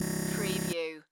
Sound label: Buzzer